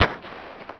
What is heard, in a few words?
Flower Shot